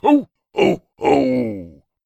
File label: human male laugh ho ho ho santa clause; zapsplat